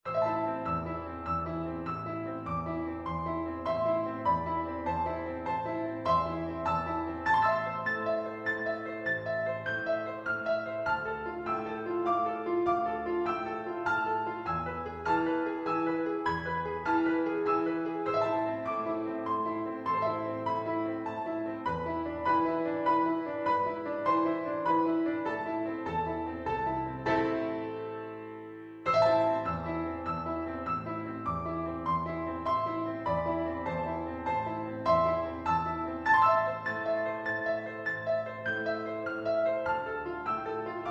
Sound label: spanish romance piano